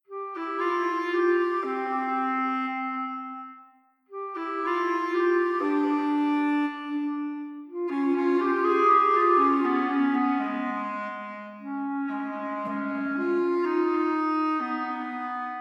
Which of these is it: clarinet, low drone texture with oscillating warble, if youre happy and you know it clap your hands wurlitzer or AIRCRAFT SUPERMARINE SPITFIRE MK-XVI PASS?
clarinet